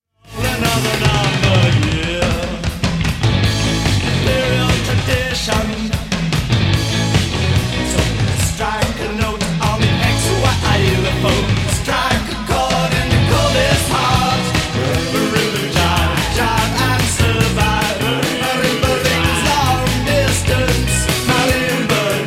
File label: red guitars marimba jive